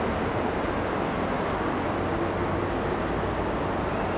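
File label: ambience city loop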